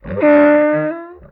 MONSTER Squeel Deep; MONSTERS & CREATURES; mono; Universal Sound FX

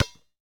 Street Ball Bounce Concrete; SFX Kit; Street Basketball

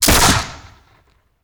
Musket; Sound Ideas; Guns

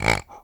Pig Grunt; ANIMAL; mono; Universal Sound FX

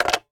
Telephone Rotary Receiver Down; Communications; 1001 Sound Effects

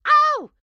grandma short yell; Blind Drive

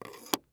Tongue Depressor; Medical; Blastwave

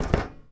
Book Place Metal Shelf; SFX Kit; Foley - Misc

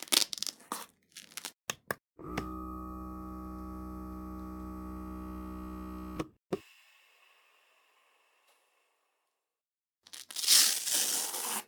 checking the blood pressure; human; NS Studios - ultra sound collection

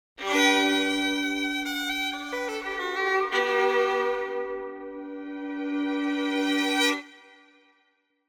Symphonic Strings Happy Solo Melody; Hollywood Edge; sound designer's toolkit v2

